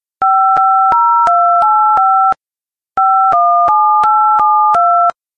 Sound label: beeps